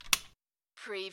office lamp switch off

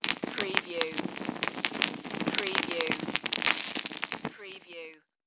Sterno Flame Extinguish

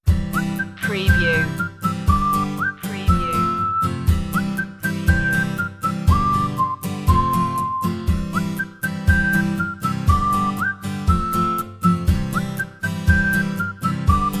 whistle happy

